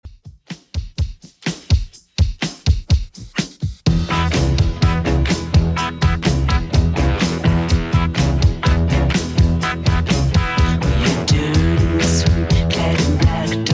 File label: 70s Redrum 125bpm